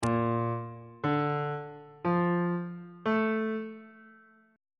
g minor 7th chord 3 is b flat major 7th on bass clef